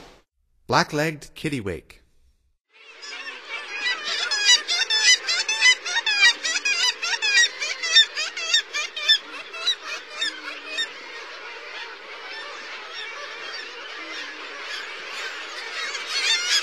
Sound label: Black-legged Kittiwake; North America; Stokes